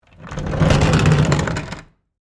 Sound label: Industry, Cart, Roll, By, Wood, Surface; Rolling